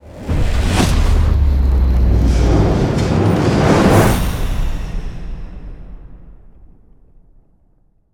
Stinger Modulate ramp; extinction deluxe edition; ps4 windows xbox one

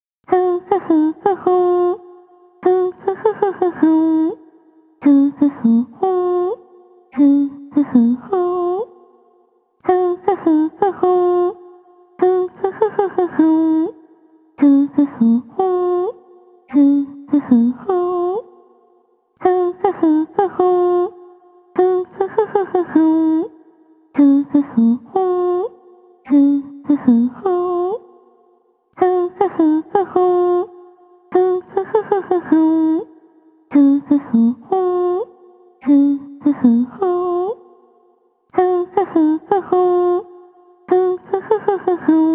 (Only Voice; Black Rock Shooter The Game; psp

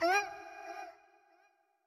Toxic Mask Hurt

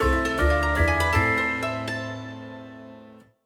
Sting Puzzle Solved

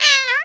Bark

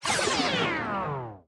Fail Vinyl Scratch; Subway Surfers City; Android, iOS; Trials Run Fail Vinyl Scratch

